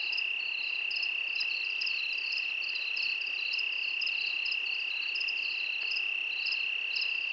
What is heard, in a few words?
bird; Flaris; Fly For Insanity